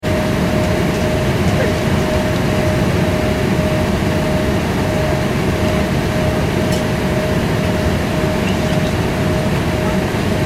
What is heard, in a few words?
transportation aircraft commercial airbus a340 interior, In-Flight Ambience; Airplanes; Vehicles; McKinney Sound